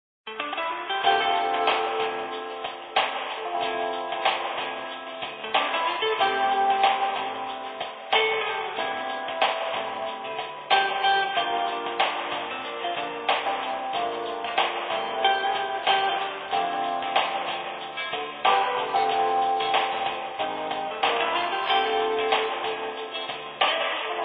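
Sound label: Music Ambient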